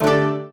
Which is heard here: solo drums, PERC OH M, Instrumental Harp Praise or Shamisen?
Shamisen